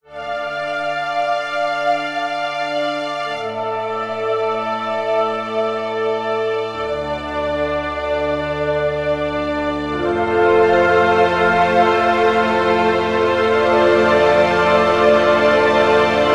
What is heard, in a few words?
Drama Mystery Orchestral Theme